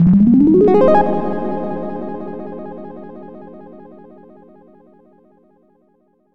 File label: A Cute Little Sparkly Synth Rise